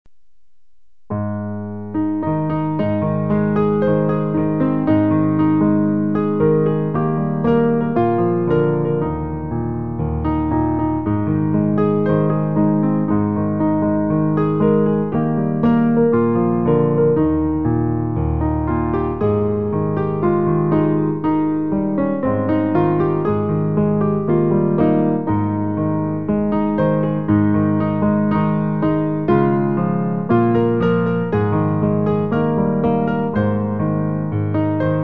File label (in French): piano